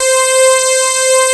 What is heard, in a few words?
PRO 5 SYNS; Pro-Rec - Synthsonic